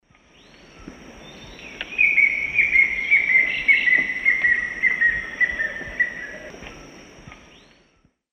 Xiphocolaptes promeropirhynchus, song; S. America; Eastern Lowlands

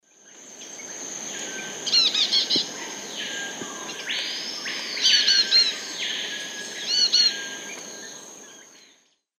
Myrmotherula longipennis, 3-noted call; S. America; Eastern Lowlands

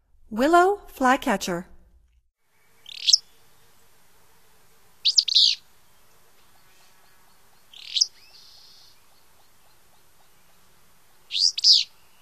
Willow Flycatcher; North America; Stokes

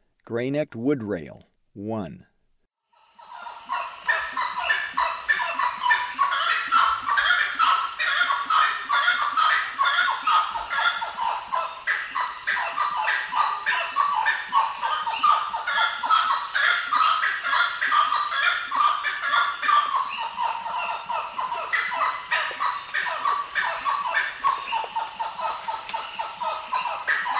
Gray necked Wood-Rail; S. America

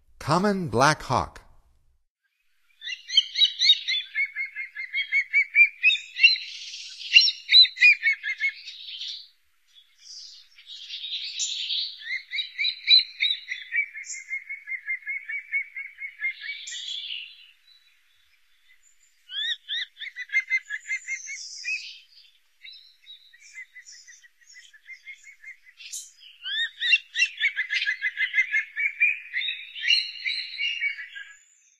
Common Black Hawk; North America; Stokes

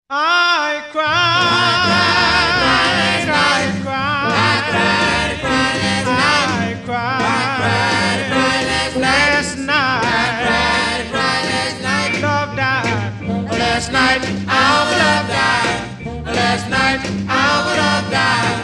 Doo-Wop

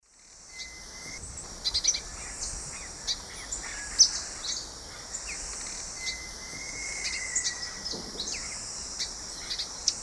Euphonia minuta, song; S. America; Eastern Lowlands

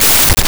BLIP

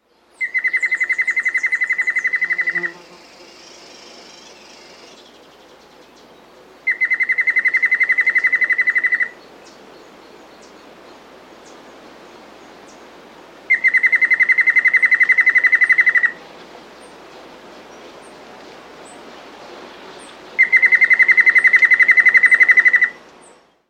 Chestnut Antpitta, Nature's Songs, S. America

